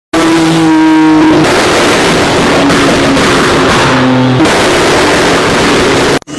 bmw rev

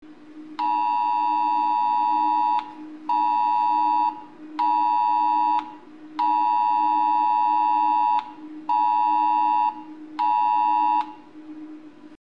alert

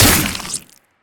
attack pierce

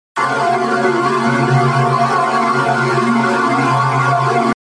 evil chorded sound effect

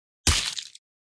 melee hitting

